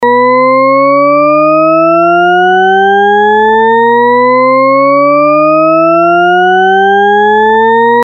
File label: rising